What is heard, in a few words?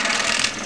grind; duke nukem total meltdown